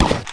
MINE; rrdemo

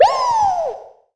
Weee; Spider-Man CD Cardz Mary Jane Watson

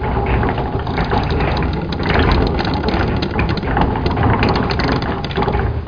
gears

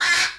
quak; Jump Zampoli

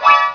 Blimm; Concentroids From Planet X

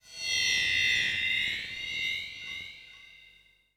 ethno 125

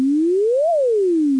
tone

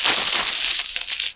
coin

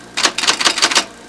typewriter spacebar; Household

